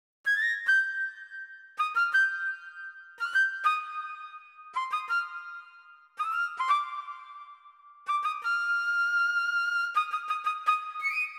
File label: flute intro, Smooth RnB; 081 Cmin